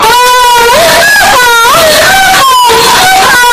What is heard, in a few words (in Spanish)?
gemidos